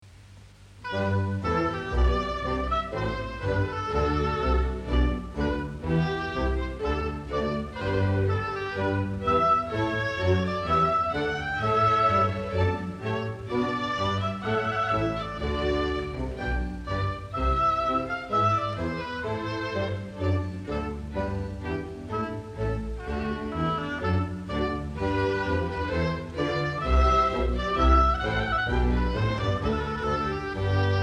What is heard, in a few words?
Aria (Gavotte), Sopran